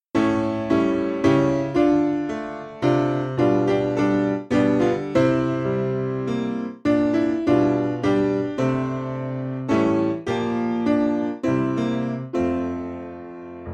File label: S Piano